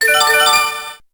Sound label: Coin; PCGenjin